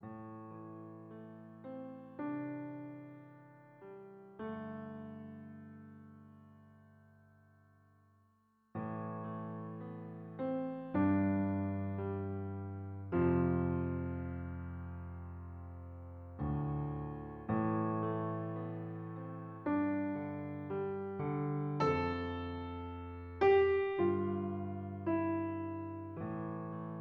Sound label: Piano Loop; PS Vita